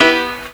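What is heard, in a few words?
PIANO chord, Ragga & Dub Reggae; USB Soundscan vol.27; AKAI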